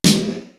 KOOKY SNARE; East West; Global Sounds

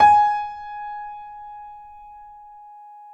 PNO F G#4; Synclavier Sampler Library

